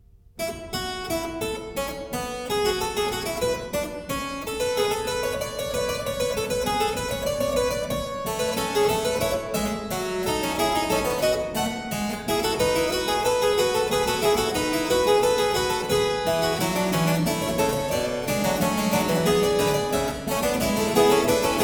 Fugue a 3, Keyboard Works, The Well-Tempered Clavier; BWV 866 Bes (B) II; Klassika; Bach, Johann Sebastian